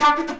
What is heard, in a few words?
flute